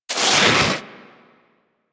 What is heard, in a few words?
Armadillo Roll Snow